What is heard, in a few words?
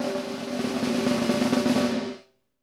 ROLL, PROC SNRS, Drumworks; Sampleheads; New York City Drumworks VOL-1